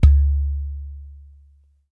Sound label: Udu